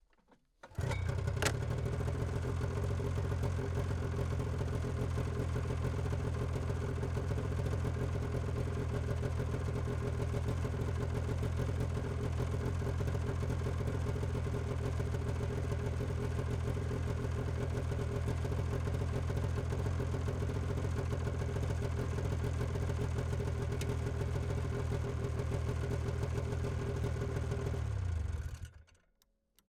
Yamaha ET 340, Ext, Start, Idle, Steady, Off, Start, Idle, Blips, Off; 1978